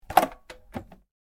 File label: rice cooker; cooking